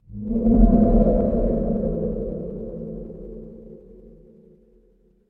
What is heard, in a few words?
horror wind whisper